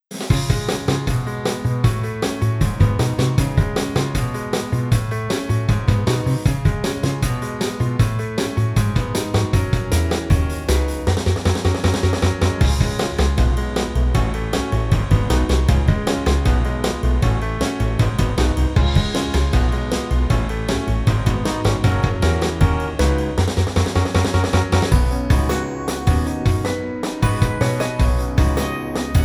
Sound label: medium hall donut rod; tascam